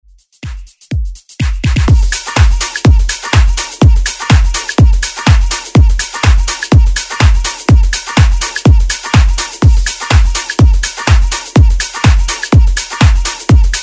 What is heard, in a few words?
House Redrum